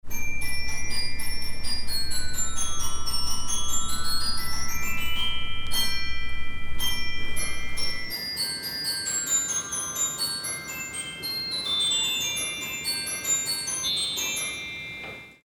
Stahlharmonika

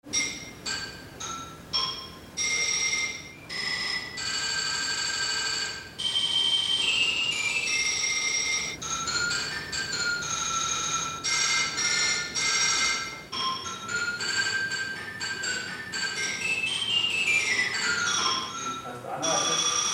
Xylophon

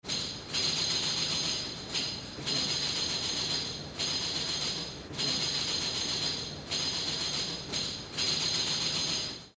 Tamburin

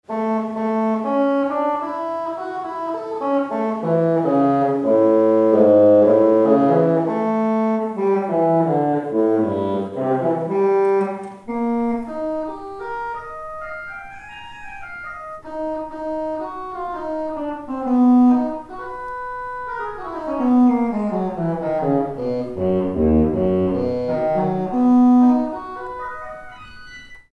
Saxophon

